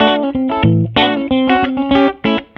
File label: F Pick Electric; Sonic Foundry ACID Music